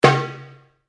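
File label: Drum Darabuka Slap fortissimo, Egyptian Darabuka Drum; Commanly available Egyptian Darabuka Drum; Musical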